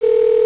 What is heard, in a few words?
RINGOUT